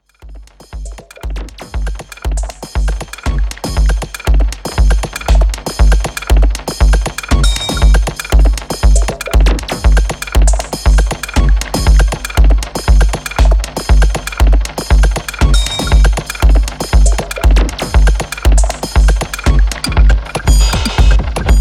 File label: drum orgies